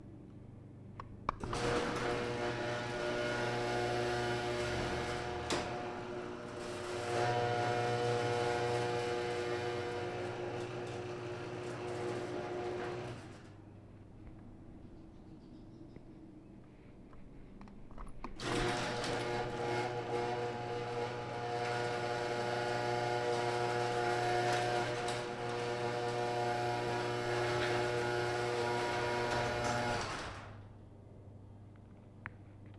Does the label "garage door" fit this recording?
Yes